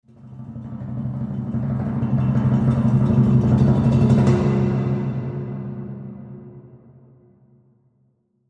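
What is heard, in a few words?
Orchestra Crescendo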